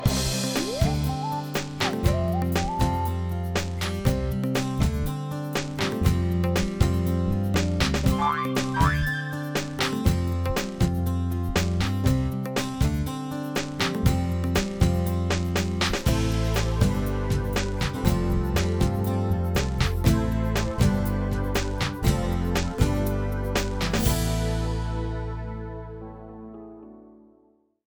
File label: Level Sting; Snd Gardenia01 Level Sting